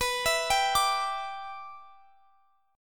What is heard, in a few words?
B Suspended 4th Sharp 5th Add Sharp 9th B; Bsus4#5add#9; arpeggiated